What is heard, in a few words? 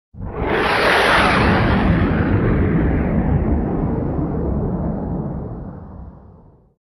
Jet Sound